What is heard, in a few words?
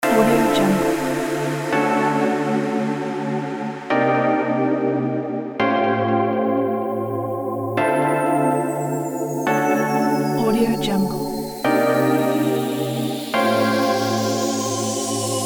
background vlog